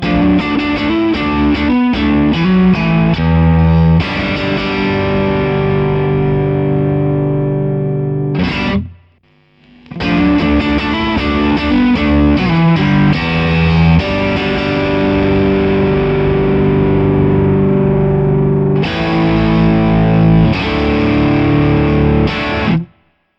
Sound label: bluguitar amp1